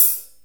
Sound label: HAT, BRUSHES